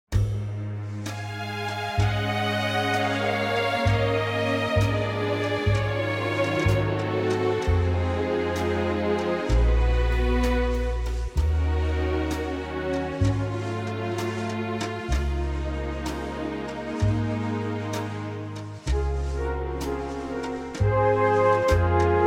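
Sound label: orchestral Db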